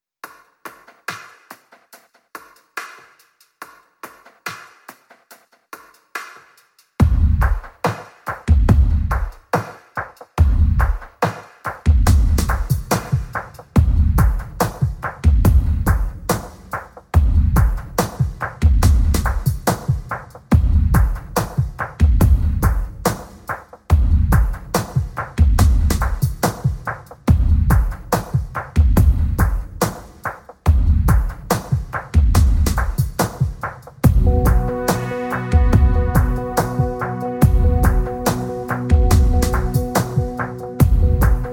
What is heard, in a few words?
perc only